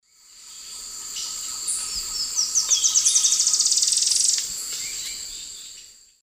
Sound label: Terenura humeralis, song; S. America; Eastern Lowlands